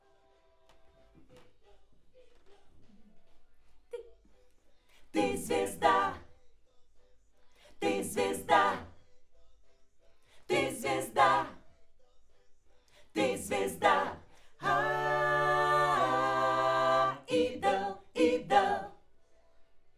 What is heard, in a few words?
Schoeps AKG, piano